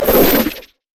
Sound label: bola strike; LoL; hunter rengar